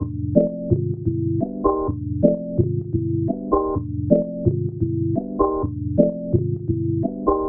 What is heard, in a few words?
pad loop organix 128